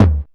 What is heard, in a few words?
LOW TOM; Back In Time Records; Korg Universe VOL-1; DRUM FAMILY